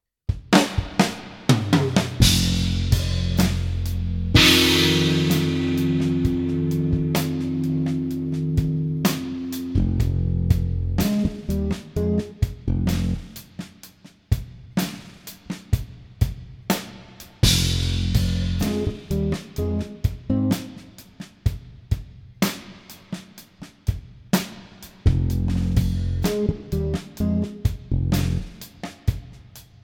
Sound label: Backing Track